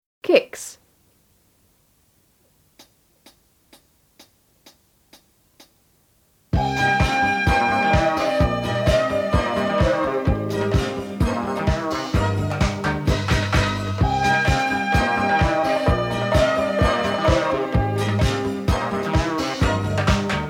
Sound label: Int Kicks, jazz; jazz intermediate foundation